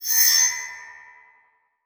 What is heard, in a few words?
Multimedia Internet CD-Rom Flash Wipe